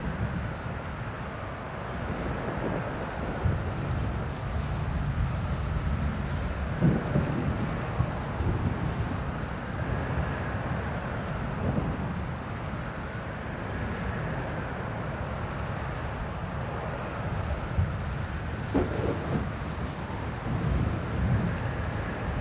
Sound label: land of storms atmos